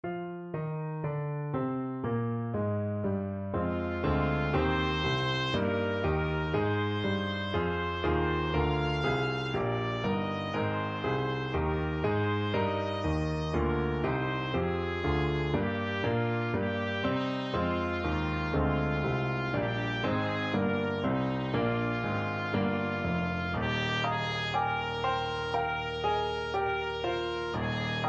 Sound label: trumpet